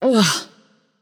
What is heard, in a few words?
nv ugh; smite; voicepack; morgan le fay